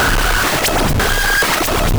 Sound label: Processed Perc